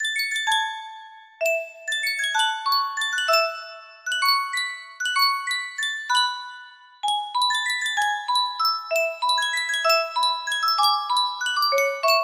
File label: yunsheng custom tune music box, music box melody; up